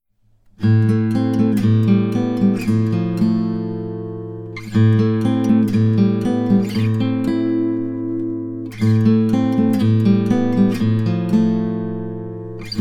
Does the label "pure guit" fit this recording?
Yes